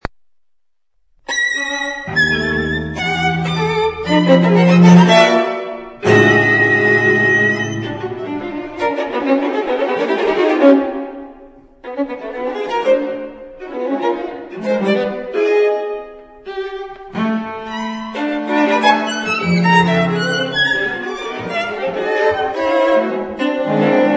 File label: String Quartet